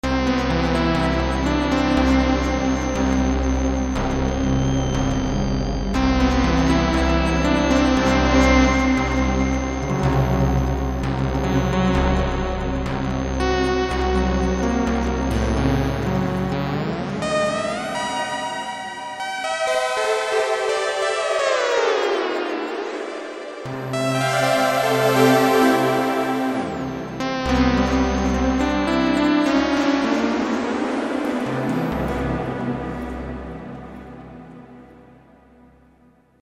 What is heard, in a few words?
Kurzweil K2000; Kurzweil K2000 Value Pack; Extra Programs